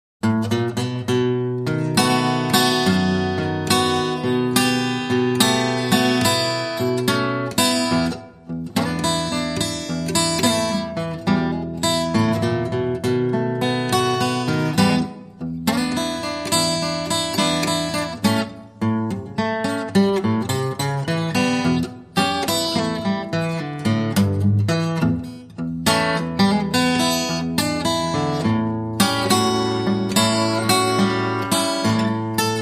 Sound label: Steel String Guitar Slow Blues